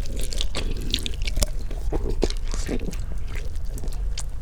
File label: Unimaginably Uncomfortable Gooshy Sounds, Melon; Micro Pack; CaptSubtle